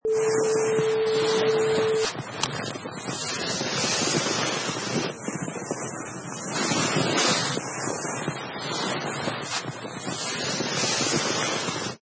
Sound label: Child Sledding